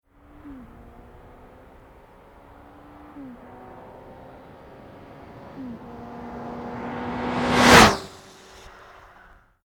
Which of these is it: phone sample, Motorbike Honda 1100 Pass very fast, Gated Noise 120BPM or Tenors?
Motorbike Honda 1100 Pass very fast